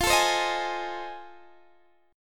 F 7th Sharp 9th, Guitar, DADGAD; F7#9 strummed; x,20,19,17,18,18

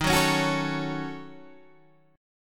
Eb Minor 7th Flat 5th, Guitar Standard; Ebm7b5; strummed; JGuitar

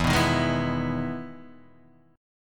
E Major 7th E down; EM7 strummed; JGuitar

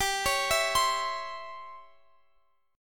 C sharp Minor Major 7th Flat 5th G

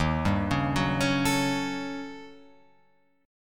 D sharp 7th Sharp 9th Flat 5th Guitar Open F; arpeggiated; JGuitar

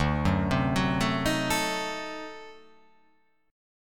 D 7th Flat 9th D down, chord

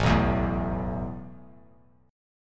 A Suspended 2nd A Bass Standard; Asus2 strummed

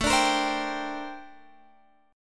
Bb Major 13th A

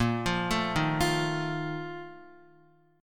Bb Major 7th Suspended 4th, Guitar; arpeggiated; JGuitar

